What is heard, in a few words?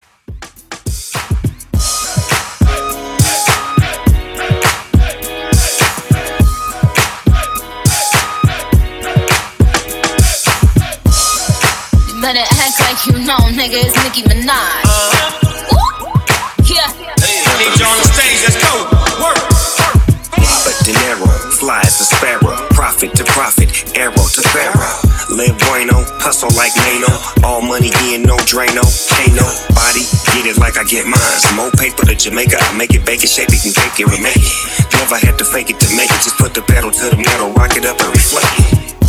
Hip Hop Club Re Drum Extended 103 BPM